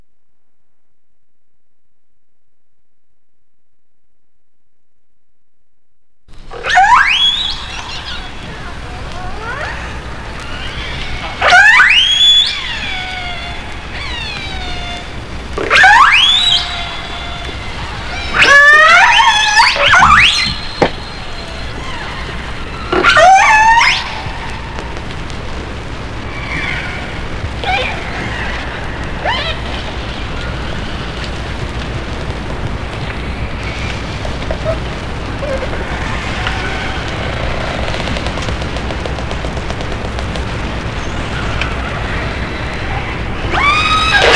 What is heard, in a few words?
killer whale calls